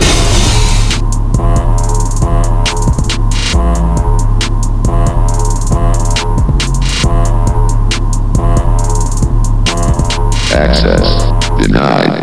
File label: drip; chatsounds; hl